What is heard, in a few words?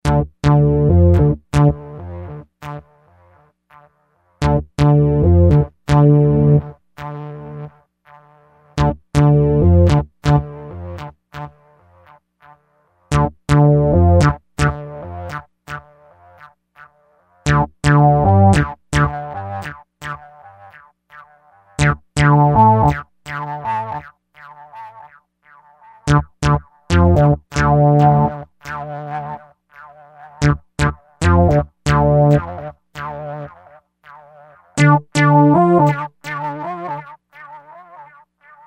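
synth bass echos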